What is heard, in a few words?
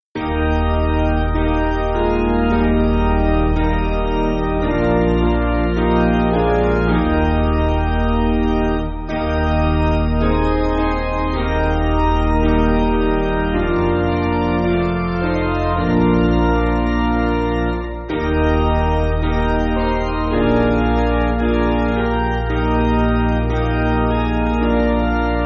Piano, Backing Files for Churches; Come Gracious Spirit; Gould; Eb